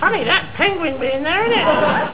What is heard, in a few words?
penguin